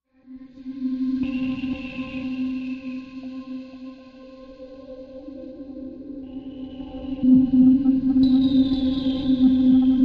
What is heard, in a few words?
Space Tone, sci fi ambience